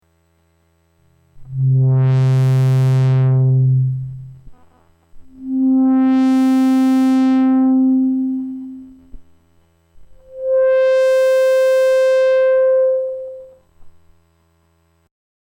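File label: triangle filter sweep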